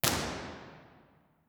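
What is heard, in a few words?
room impulse response, concert hall; jack lyons; university york; b-format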